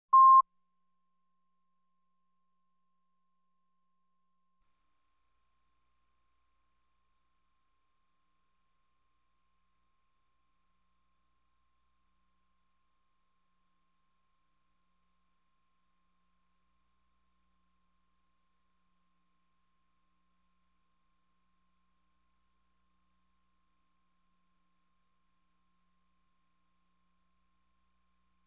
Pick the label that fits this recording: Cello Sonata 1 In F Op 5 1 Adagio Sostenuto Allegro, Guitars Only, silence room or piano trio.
Guitars Only